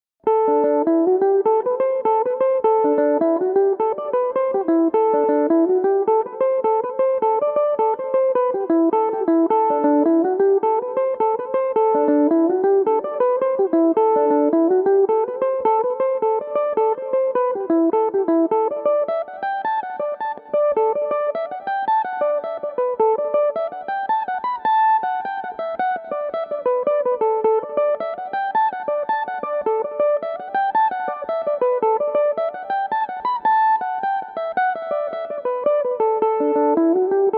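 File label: d mixolydian, mandolin; The Tuppenny Damn; Belmuse EM-190 electric mandolin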